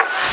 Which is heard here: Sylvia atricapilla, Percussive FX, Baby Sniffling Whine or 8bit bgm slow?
Percussive FX